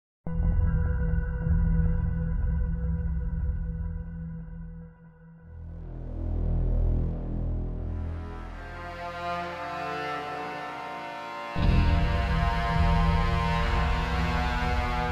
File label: Ambient; OST Borderlands